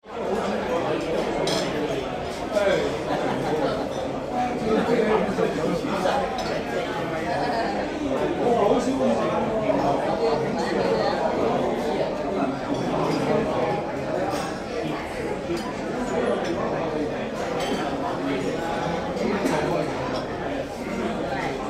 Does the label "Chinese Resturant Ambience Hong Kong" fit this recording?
Yes